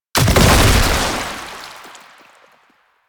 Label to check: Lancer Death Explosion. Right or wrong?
Right